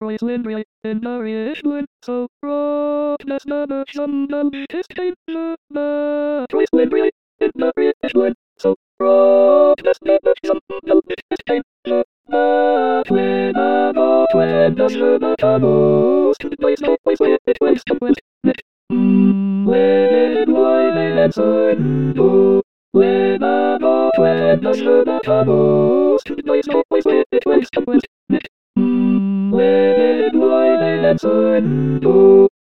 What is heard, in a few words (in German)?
Zigeunerlieder tutti